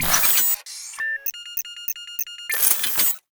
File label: Mine or System activation, Future Weapons; SoundMorph; Utilities; GDC Game Audio Bundle